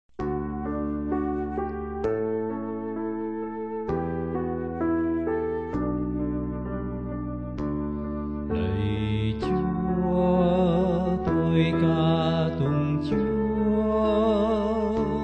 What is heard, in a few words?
ALTO